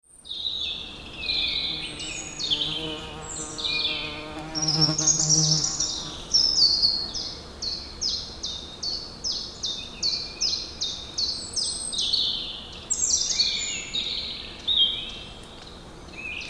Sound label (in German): waldatmo; waveland tonstudio